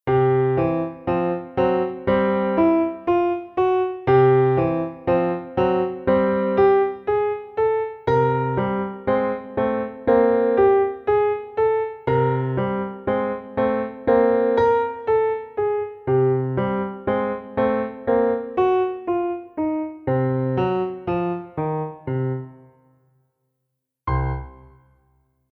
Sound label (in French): Piano seul; Jazz